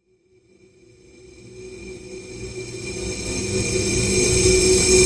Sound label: Inquisitive Breathy Sweep